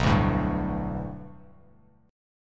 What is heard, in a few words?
B Minor 7th Sharp 5th B Bass Standard, chord; Bm7#5; strummed; JGuitar